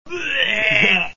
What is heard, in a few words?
Bwueeehw